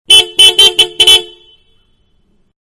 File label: Small Car Horn Several Exterior; Vehicles; Car Small-Horn-Several, Exterior